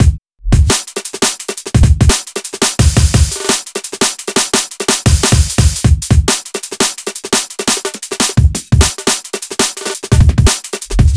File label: tears breaks; M8; percussion and foley